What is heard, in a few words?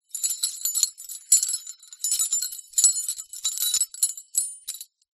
household keys prison guard swing movement